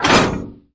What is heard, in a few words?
building drop